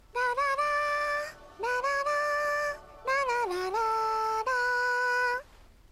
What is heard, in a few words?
Humming - Ballad of the Wind Fish; Hyrule Warriors Definitive Edition; Marin's Humming